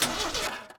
Bad Input; Cars; XBOX

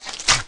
Mutant Spit Attack; Valleakan Mutant 1; Aliens

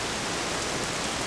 rain; Ambient; MX vs ATV Unleashed; XBOX

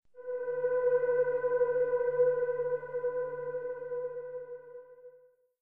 stinger; Myst III Exile

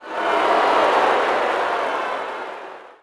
Boo, Crowd, reactions

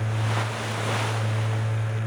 outboardboat; Soldier Of Fortune II Double Helix; XBOX; ambience

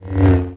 lightsaber swing fast; weapons

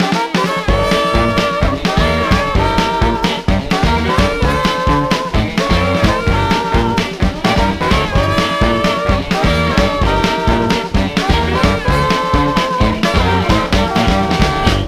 pig chase; Voodoo Vince; XBOX

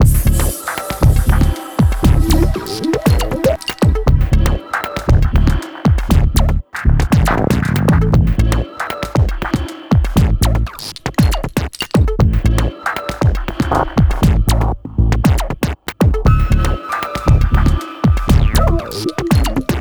menu loop; Pulse Racer

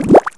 Trap Enemy; Finding Nemo; XBOX

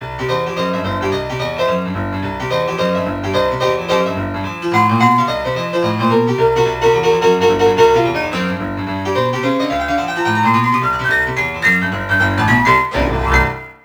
Sylvester Piano; Loons The Fight For Fame; XBOX

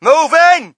crowd, shout; Mike Tyson Heavyweight Boxing

